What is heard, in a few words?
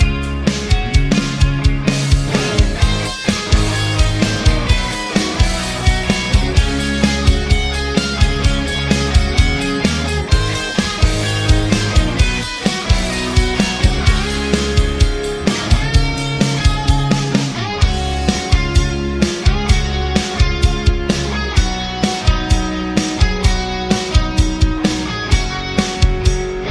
guitar; rock